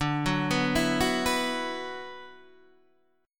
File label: D 6th Add 9th D, Guitar, Standard; D6add9 arpeggiated; 10,9,9,9,7,7; JGuitar